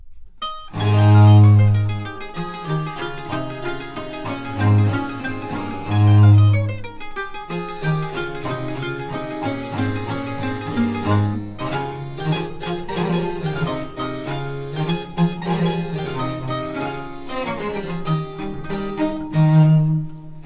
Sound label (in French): SONATA La Majeur Allegro